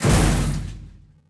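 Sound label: metal debris hit large; objects; Star Wars Obi-Wan; XBOX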